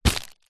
balloon foil small inflated grab pick up from carpet